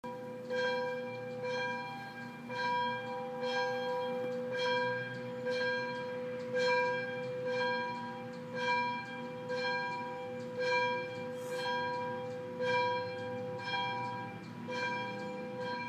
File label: church bell